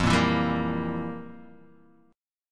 F Major 7th Suspended 2nd Suspended 4th, F, down, Guitar, Half Step Down